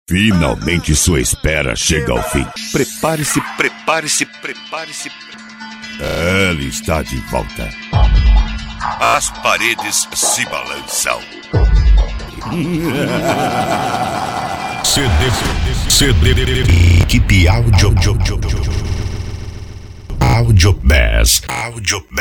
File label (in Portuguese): audio bass, Balada; equipe audio bass; indianopolis mg